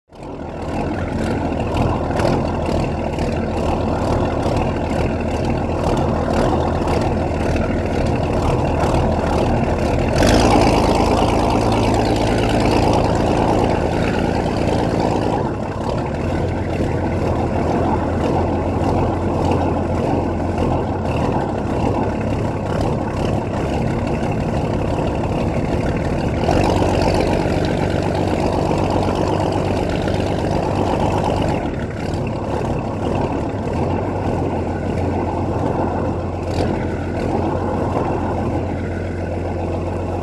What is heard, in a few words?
Boat with Engine; Run ( Ext. ); Throaty Watery Idles With Small Maneuvers.; powerboat